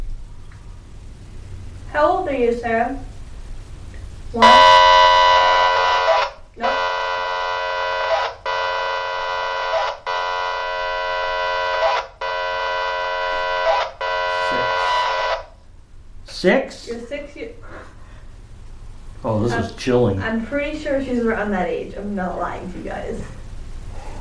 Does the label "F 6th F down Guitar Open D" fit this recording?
No